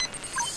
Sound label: holo comm; Star Trek